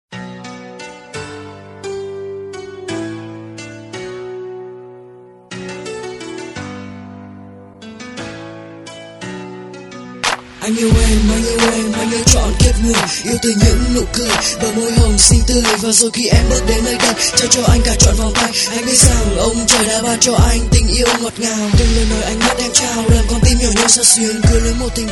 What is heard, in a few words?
rap; viet rap